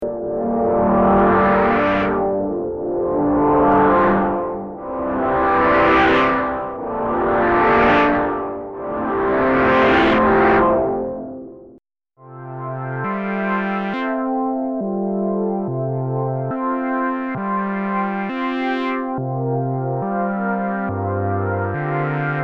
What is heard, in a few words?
chord filter moog